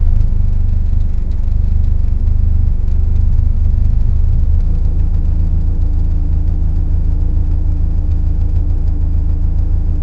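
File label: Porsche W18 engine 18 cylinder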